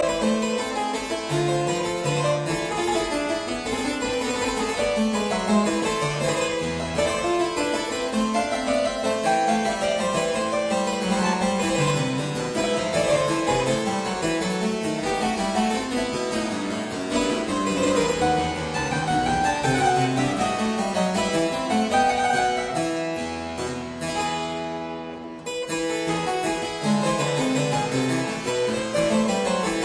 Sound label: French Suite No 3 in B minor BWV 814 II Courante